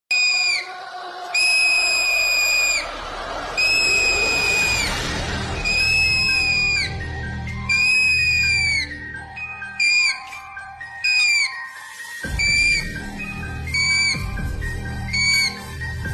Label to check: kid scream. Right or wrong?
Right